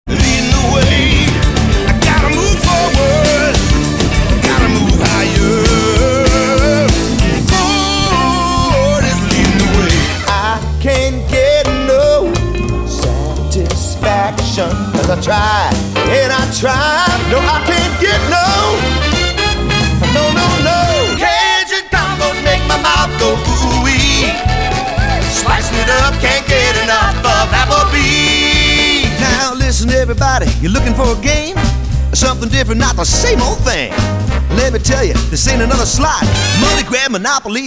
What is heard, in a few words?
Singing